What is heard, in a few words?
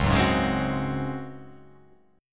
C sharp Major 7th Suspended 4th C sharp